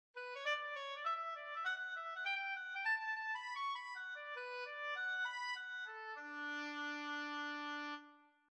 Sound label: Oboe